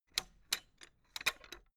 fence gate latch rattle hard; objekte; tueren

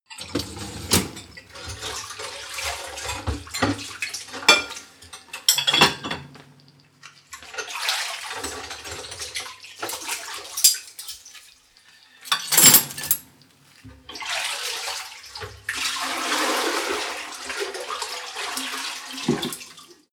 pull tableware sink

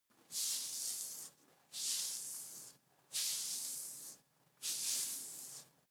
hair short hard comb sequence; berufe; friseur

